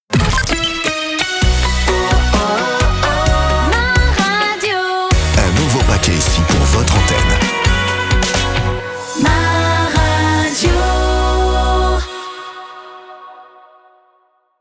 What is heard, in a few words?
jingle; MA RADIO AC VOL.2